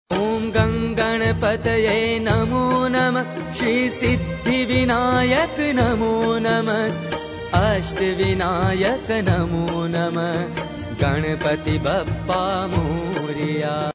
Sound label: ganesh mantra